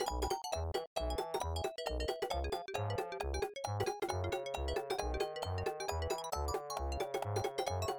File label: bonus level; jungle-book